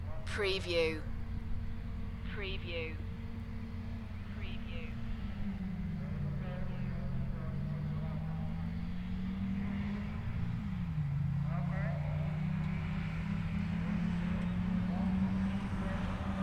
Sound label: Dirt track car racing UK ambience from a spectators position; beanstalk audio